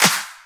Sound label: Clap